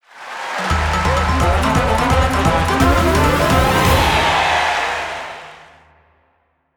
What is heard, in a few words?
Stage Clear Dance (Kabuki ver.); Kirby Star Allies